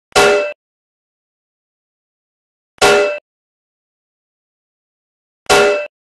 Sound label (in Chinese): Lingdang